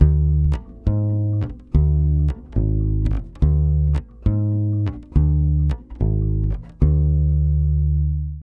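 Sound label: 140 bass D diminished, brazil bossa; USB Soundscan; AKAI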